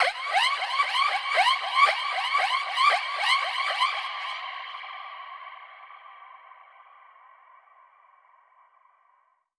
Verb Proc C, alarm siren, 125bpm; musicradar

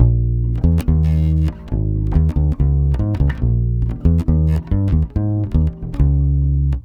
BASS GROOVE, Brazil Bossa; AKAI; USB Soundscan vol.16

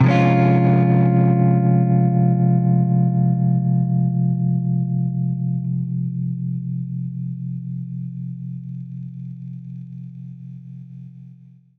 Baritone Chord Fminb6, Guitar Chords; undercover samples

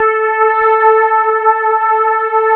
electric pianos; Wizoo Electric Pianos

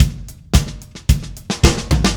Drums Fill, Indie Rock; Sonic Foundry (Sony Creative Software); Indie Rock Anthems; Session 01 - Brighton

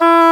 WND English Horn; Roland L-CDX-03 Disk 1

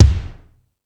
Ambient Rock, Drum Machines

